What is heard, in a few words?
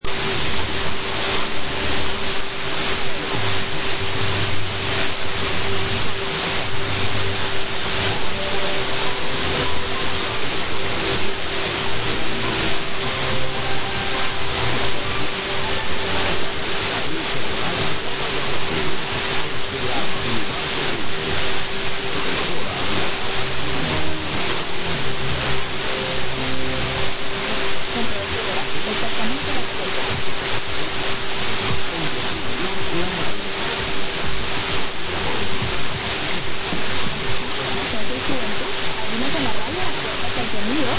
Cuba; 1040 Radio Mayabeque